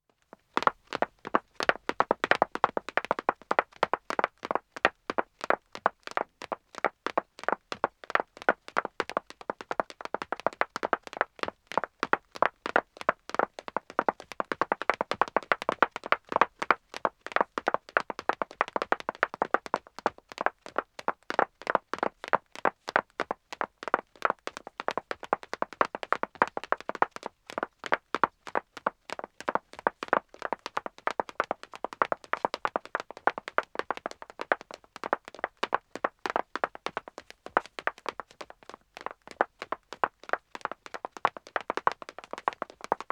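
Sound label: Wood (Dead Acoustic) Running 2 women, Footsteps; BBC Sound Effects Library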